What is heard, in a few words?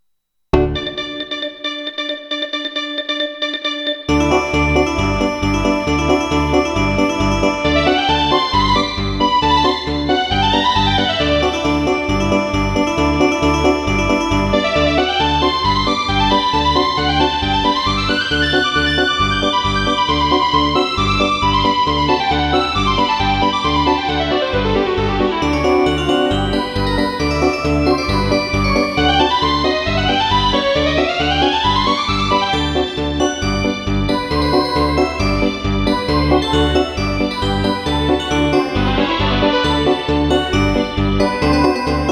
polka